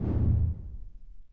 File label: collapse; gnome-audio-k1; panel